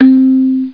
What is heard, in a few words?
Kalimba; HyperComposer™ DEMO